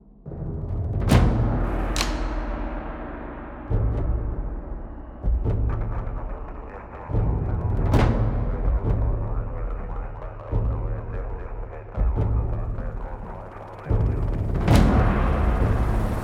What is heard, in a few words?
Main Menu Music Drone Wave; battlefield bad company 2